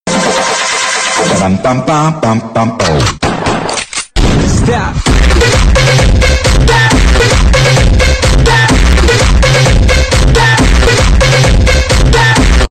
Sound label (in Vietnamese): Nhac Chuong Bam Bam Bam Bam Bam Bau Start